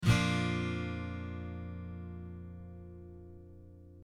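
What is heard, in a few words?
B♭, guitar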